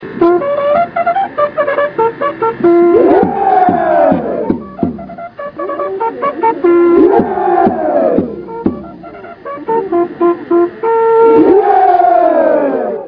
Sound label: fanfare; fctokyo